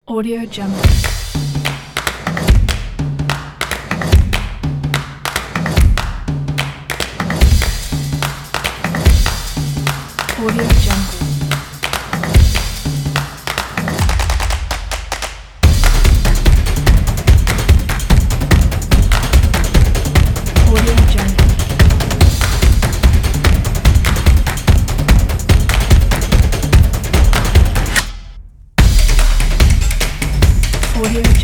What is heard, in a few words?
drumming